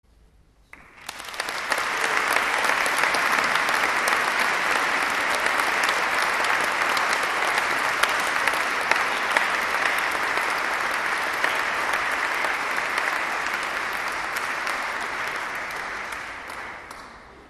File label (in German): APPLAUS Unterstufenchor